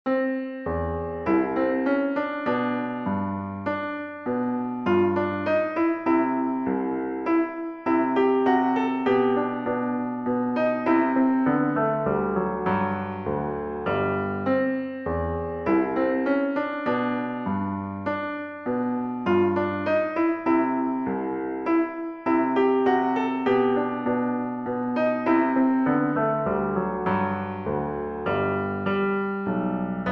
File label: Minuet in C Major, piano; Mozart; K6